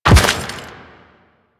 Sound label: Step Dirt, Footsteps; Warframe; The New War; Kahl; Quests